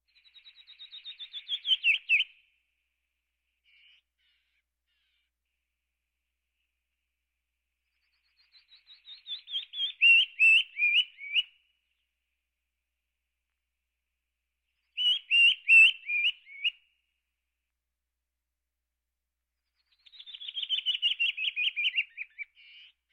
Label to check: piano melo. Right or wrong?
Wrong